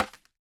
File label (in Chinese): 竹放置声